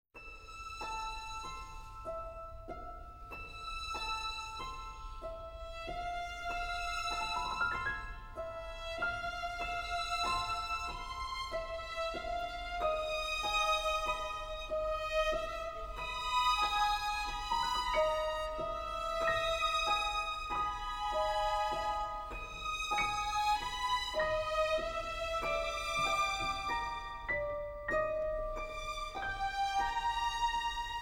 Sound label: Instrumental